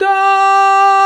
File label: VOX, Male Scats